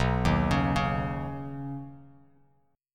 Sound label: B Major Add 9th, Badd9 chord, Bass Standard; arpeggiated; JGuitar